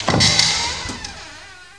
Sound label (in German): Tür auf